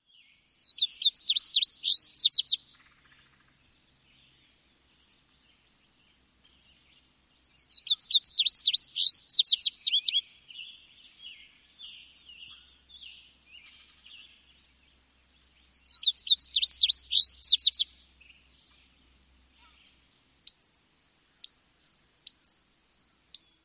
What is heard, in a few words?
indigo bunting